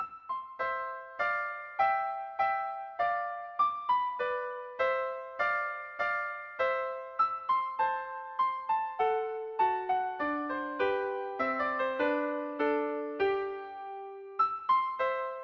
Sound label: flute duet